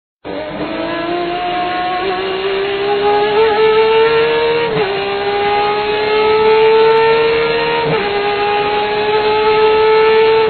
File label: f1 car v10